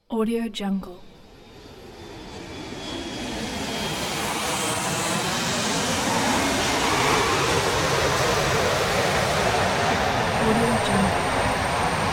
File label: airplane landing with tires screeches